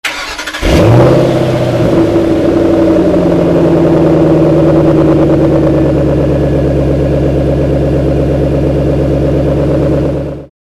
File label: start, mustang